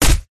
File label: human punch smack hit; zapsplat